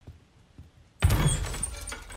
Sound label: upstairs bang